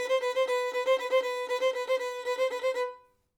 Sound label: violin